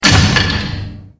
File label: crusher machine